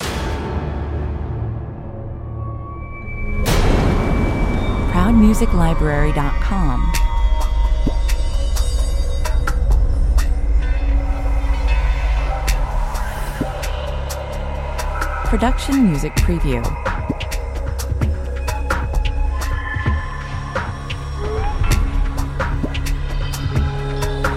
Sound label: creepy fluid impact mix 87 bpm